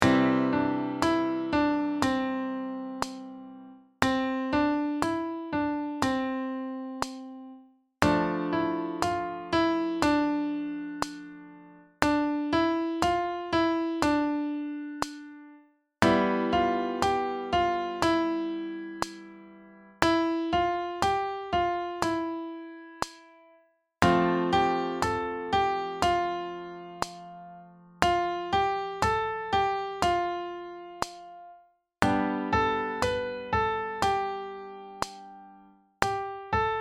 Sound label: Lip Buzzing Exercise